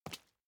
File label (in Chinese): 硬地面, 脚步